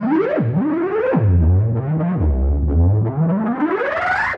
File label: Feed chorused, Feedback FX; 99Sounds; Dark Engine